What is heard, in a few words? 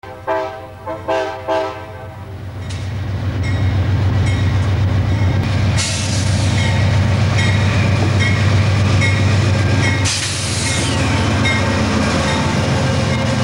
Amtrak "Genesis" leaves Hudson station; AMT Genesis 839; Diesel Sounds